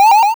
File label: Powerup